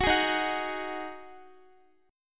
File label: Eb Minor Double Flat 5th, Guitar; Ebmbb5; strummed